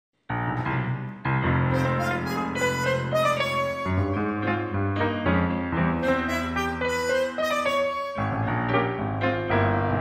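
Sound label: Fm piano play reference harmonica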